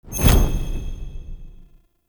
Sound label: Sweetener Heavy Attack Stun; Weapon Impacts; Player; Sweeteners